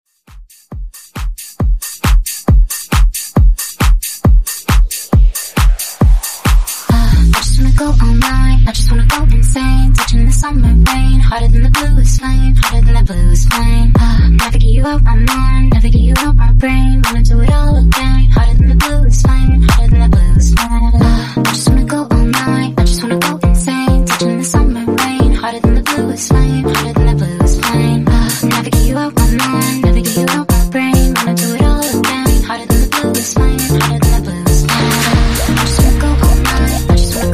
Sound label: 136 BPM; MIXSHOW EPIC